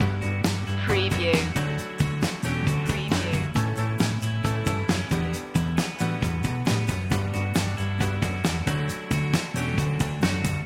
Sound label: rainbow pop loop